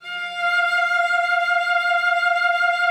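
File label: F5, cello vibrato; Xsample VOL-7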